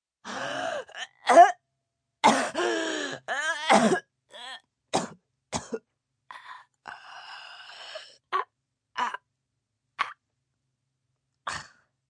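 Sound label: Choke Dying female, Choking, female, coughing, dying, gagging, gasping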